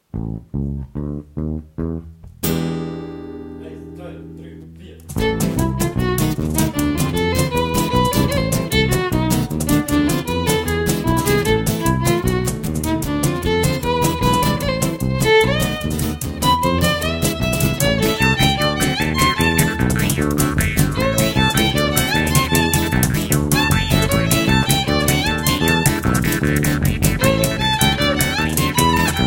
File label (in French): Chappeloise Bernoise